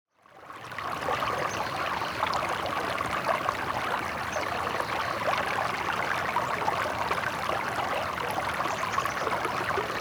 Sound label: River; DR100; Mokelumne River